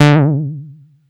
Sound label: Bass Juno D; Vengeance Samples